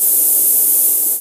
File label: Open Hihat; Vengeance Samples; VEE Cymbals